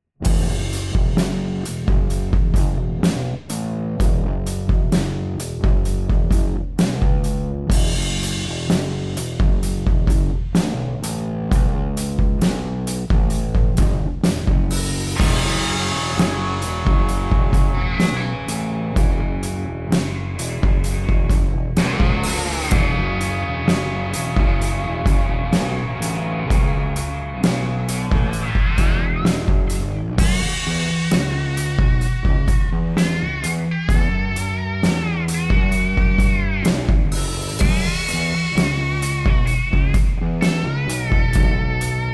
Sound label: Rock cue